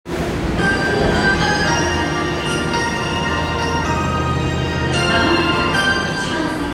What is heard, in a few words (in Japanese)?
東西線落合西, train sounds